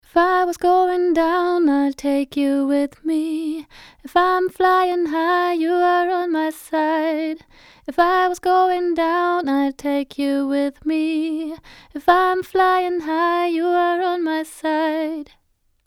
Chandler Limited TG Microphone Cassette Vocal Soft Compression